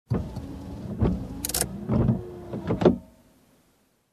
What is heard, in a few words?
Turning of Windshield Wiper